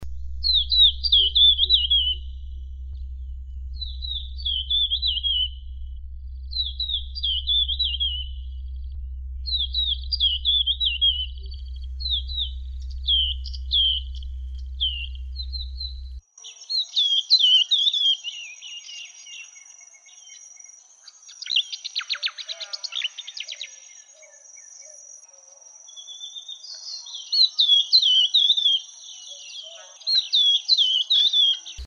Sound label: jerdon babbler